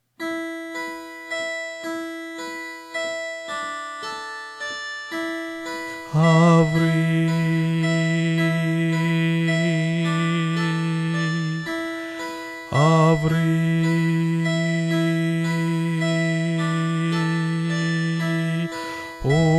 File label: hymn bass; music recordings